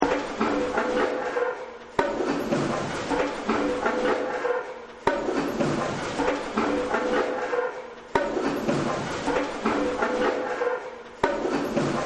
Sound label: Factory; Factories; Backgrounds